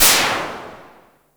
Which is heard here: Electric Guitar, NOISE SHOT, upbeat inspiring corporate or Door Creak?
NOISE SHOT